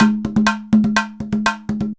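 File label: emelabo grooves; Best Service; World Colours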